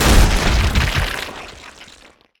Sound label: break flesh